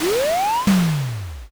missile

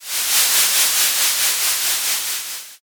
torch

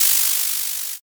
fizz

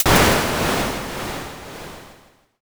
thunder

